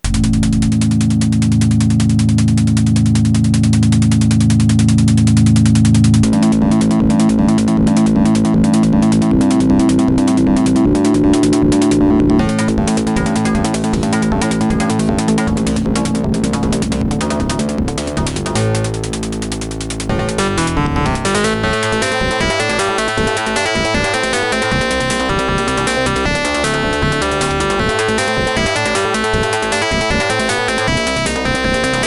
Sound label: s3 sonic vibes